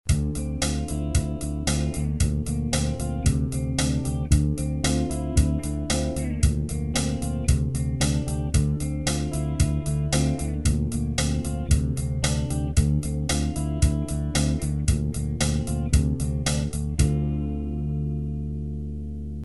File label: pedal 8th